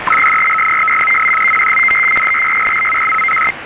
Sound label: MEROD 150Bd 800hz